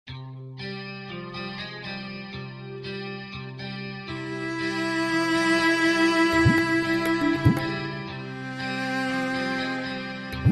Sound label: Ethnic Rock Epic